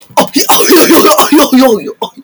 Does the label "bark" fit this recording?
No